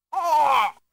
punched; RedDot

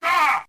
aaah; Todoh; RedDot

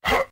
punch; RedDot

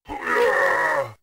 Big damaged; RedDot

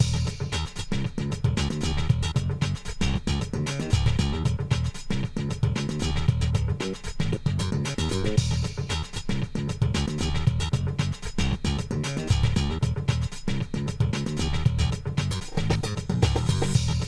Groovy Untight Funk

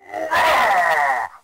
charge Attack; RedDot

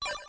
High score; RedDot

